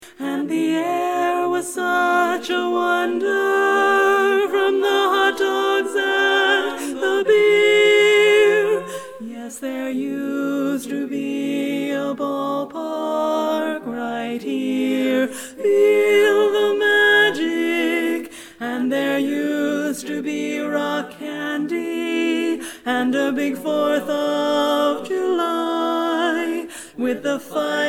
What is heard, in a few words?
Ballpark Lead